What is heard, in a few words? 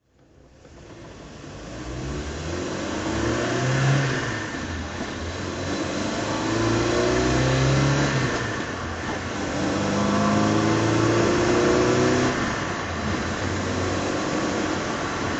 volvo p1800s drive